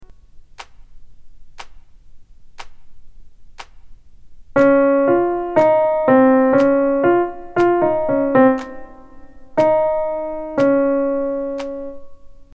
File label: Melodic Dictation